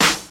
nineties sharp snare drum f key, steel snare drum sound tuned to the F note; snare drum samples